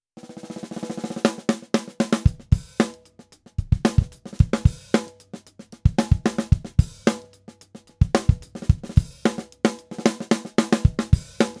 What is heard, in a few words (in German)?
Messing hoch gedämpft